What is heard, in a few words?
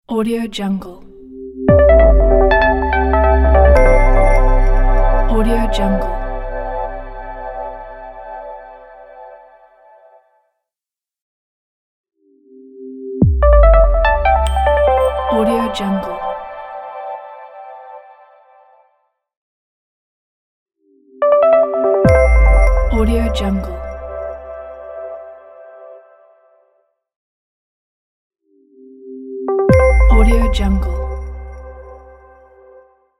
modern elegant logo